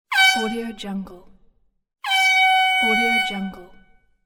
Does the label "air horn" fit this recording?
Yes